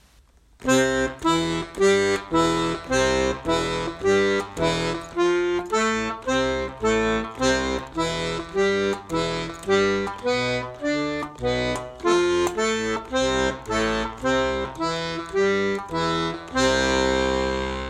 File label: blues groove